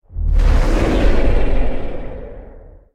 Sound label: growl, enderdragon; mob; Minecraft